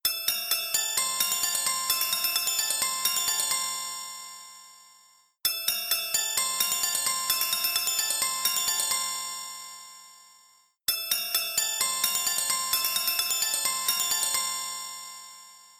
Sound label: iceblue tone ringtone; samsung galaxy s4